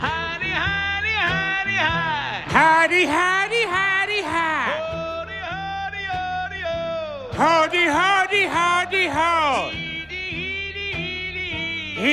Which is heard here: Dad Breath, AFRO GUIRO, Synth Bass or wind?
Dad Breath